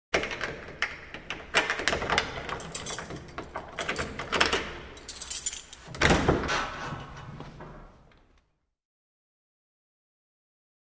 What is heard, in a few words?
Large Wooden Front Door; Open, Close And Lock, Reverberant Interior